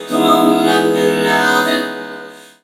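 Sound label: LET ME LOVE, VOCODER, Funky Vocals; USB Soundscan vol.18; AKAI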